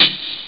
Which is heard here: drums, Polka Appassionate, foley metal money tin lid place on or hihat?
hihat